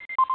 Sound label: sound new ticket